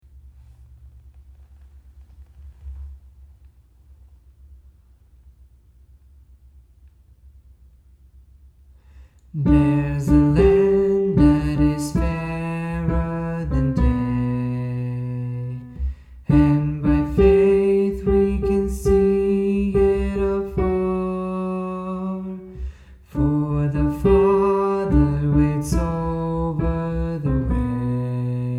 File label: Alto